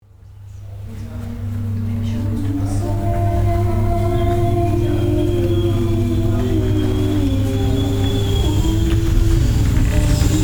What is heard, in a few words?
SONG baltic WAY hum